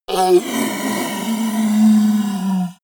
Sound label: yeti groan pain long; kreaturen